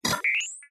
science fiction robot glitch poof beep chirp cyberpunk; zapsplat